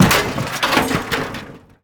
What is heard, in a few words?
metal break